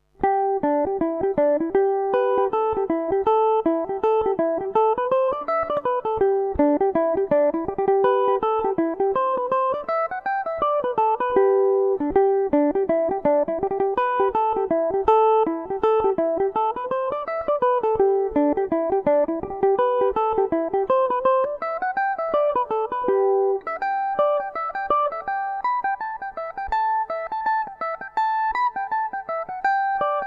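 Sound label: G major revelation, Irish mandolin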